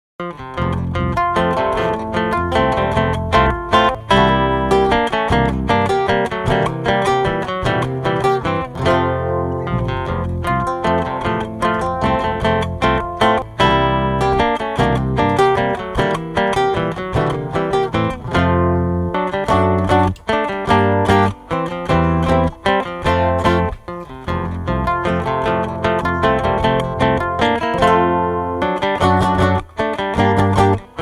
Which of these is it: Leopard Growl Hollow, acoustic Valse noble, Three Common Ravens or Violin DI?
acoustic Valse noble